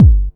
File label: Kick, Drums & Percussion One Shots Drums